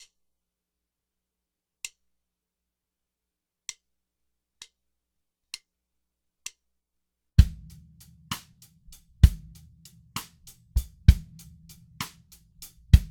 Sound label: Panning Test Drums